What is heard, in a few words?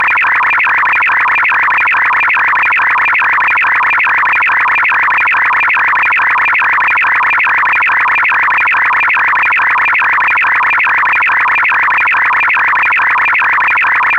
JT9H fast; JT9H fast mode, from WSJT-X with default 700Hz tone setting